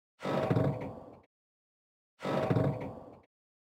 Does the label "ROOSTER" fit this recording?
No